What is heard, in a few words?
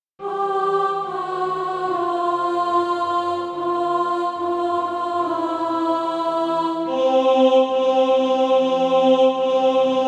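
alto, Practise Music; Dare to Do Right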